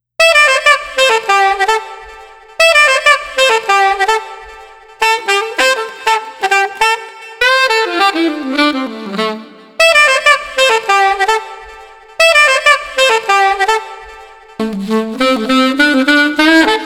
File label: Street Alto Sax Groovin 100